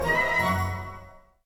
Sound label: Music Dialogue Joyful End 60bpm; Asterix Obelix Slap Them All 2